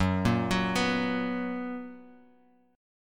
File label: F sharp Major Add 11th; F#add11 arpeggiated